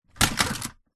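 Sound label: leisure toy drop into toy box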